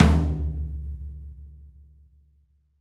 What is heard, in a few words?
Pop Tom; Roland BK-5; Toms